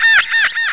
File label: ahah; AVX demo